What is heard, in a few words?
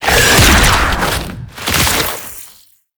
Infested Lich Summon Tentacles Impact; Warframe; Abilities; Zeke